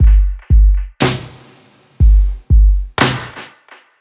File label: Dubby Kit C